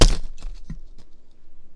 snare ish lighter distortion, Cigarette Lighter Kit